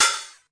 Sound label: prophet 909 Hat Open; Prophet with Processing; Hip Hop; style kits